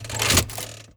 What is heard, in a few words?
start, slot machine